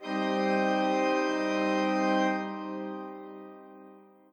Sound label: chord in g c d p g c d p